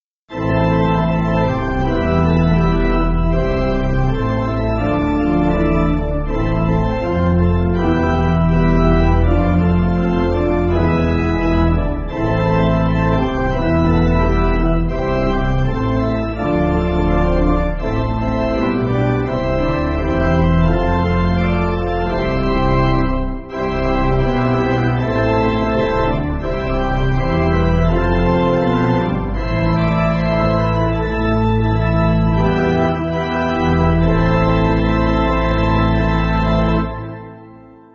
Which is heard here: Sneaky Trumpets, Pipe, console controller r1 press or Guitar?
Pipe